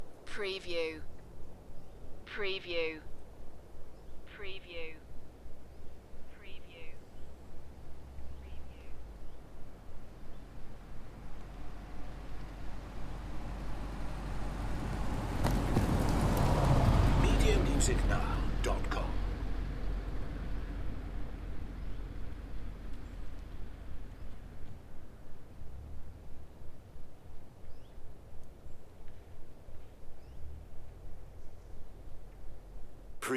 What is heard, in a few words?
Welsh Forest ambiance distant cars and drive by; beanstalk audio